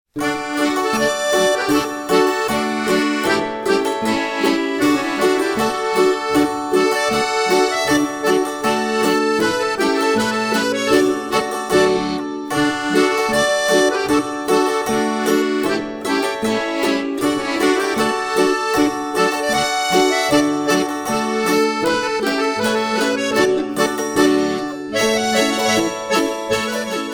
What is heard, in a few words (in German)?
Boarischer